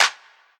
hand clap f sharp key; percussion; single hits one shots

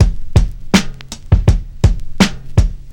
82 bpm breakbeat sample g sharp key; drum loops

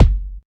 low steel kick drum sample f sharp key

